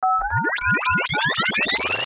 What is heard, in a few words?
Power Up Dial Tone Zaps Ascend; Rise And Dive